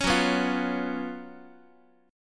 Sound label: F sharp Major 7th Suspended 4th, Guitar; F#M7sus4 strummed; x,x,4,4,3,4